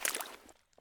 prone puddle; Escape from Tarkov

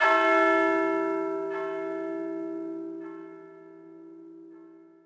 small bell; ambient; World of Tanks Blitz

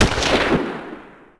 Rifle Fire; Civ4 beyond sword

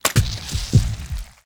syringe inject self; Rust

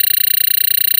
experience fill; Call of Duty Modern Warfare

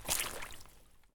swamp walk; Escape from Tarkov

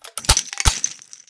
Inf Weapons; Red Orchestra 41-45

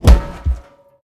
damage; World of Tanks

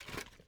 ui pickup plastic junk; Rust

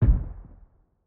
f1 grenade explosion distant; Rust

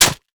bone knife strike soft; Rust

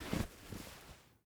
double shotgun deploy; Rust

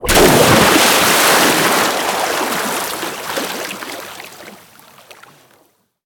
impacts; World of Tanks

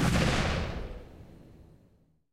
8mm tail close, guns tanks; War Thunder

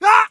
hit; Escape from Tarkov; scav4

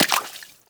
foot mud; footsteps

